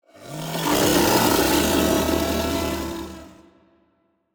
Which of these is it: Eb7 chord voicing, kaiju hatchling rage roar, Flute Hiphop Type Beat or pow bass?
kaiju hatchling rage roar